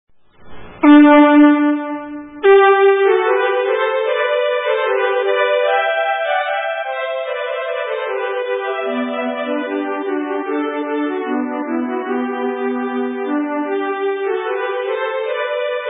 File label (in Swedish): Brudmarsch